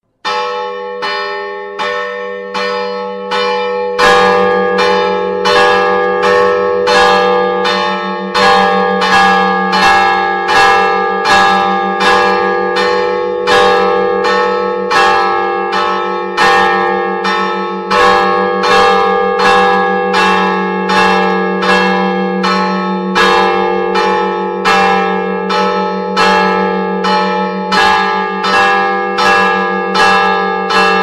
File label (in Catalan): campana